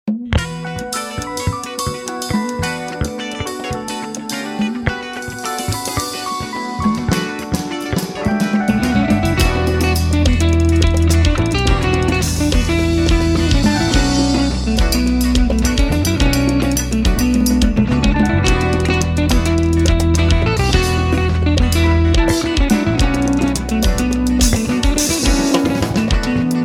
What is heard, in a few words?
flying seagull, music; jazz harmonies